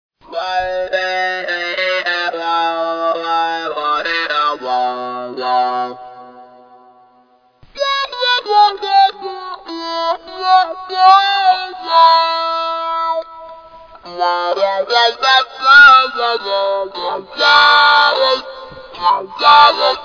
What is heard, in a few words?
mouthtube, synthesizer